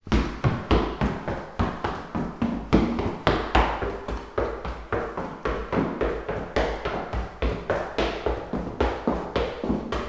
foley footsteps running wooden floor reverberant corridor